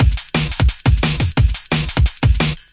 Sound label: stomping thuddy break, drum loops and breaks, 175 bpm; 161 to 180 bpm